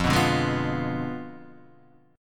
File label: F Diminished 7th F